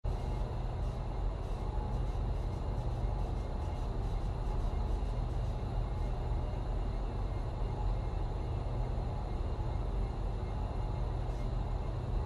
Science Lab / Air Fill; Heavy Room Tone, Hum, Tunnel Or Basement.